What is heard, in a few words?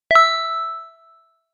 text receive; Sound design for the opera composer: concepts and methods; Vicky and Albert audio cues